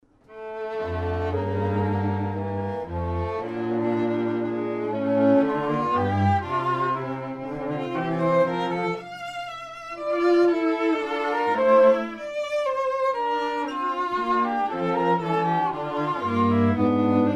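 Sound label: string trio; sokolov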